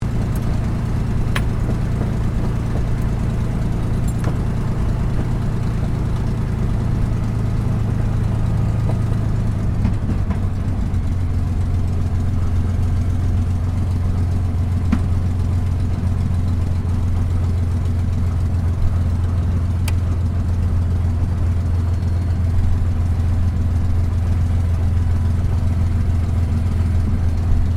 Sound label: AIRCRAFT CAC CA-18 MUSTANG MK23 TAXI SHUTDOWN INTERIOR; CA-18 /P-51 Mustang; Airplanes; Vehicles; Aircraft Collection; Twistedtracks